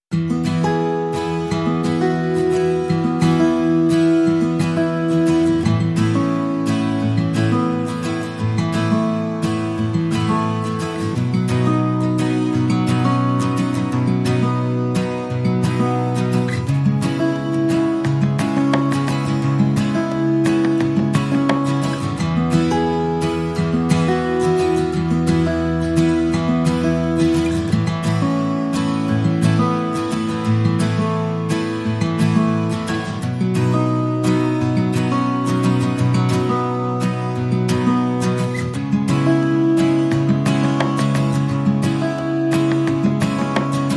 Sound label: Only Guitars